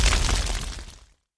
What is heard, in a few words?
air hit; propack